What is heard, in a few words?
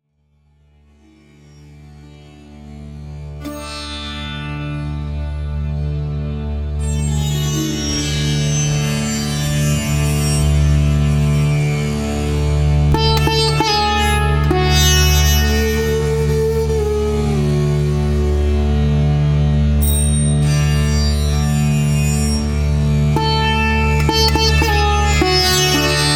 sitar